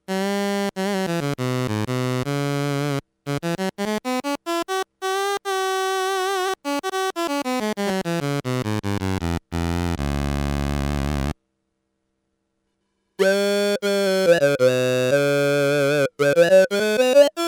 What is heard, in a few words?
Talking Flange